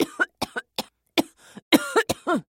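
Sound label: sounds of wheezing in a person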